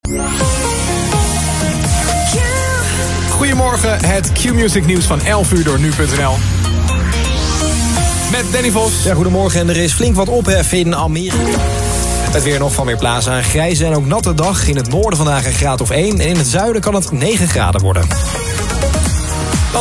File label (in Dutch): Qmusic nieuwe vormgeving; Tom & Joe; weekendshow op Qmusic